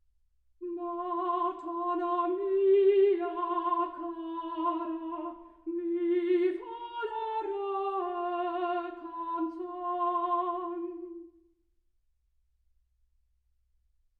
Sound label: soprano